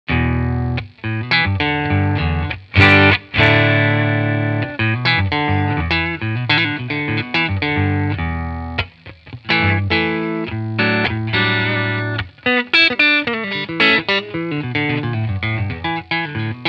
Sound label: 4x10 Ribbon sm57 hall; Captor X